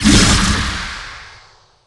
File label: dispel breath